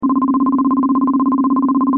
Current Loop; phet io wrapper sonification; ohms law

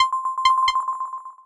Break Off; friction; Spatialized

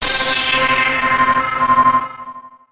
Breaking; molecules-and-light

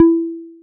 wall contact; phet io wrapper sonification

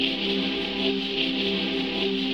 Jarble Ambience; balloons-and-static-electricity

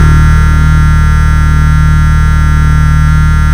haptic buzz loop with bass; haptics playground

